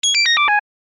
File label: thirororo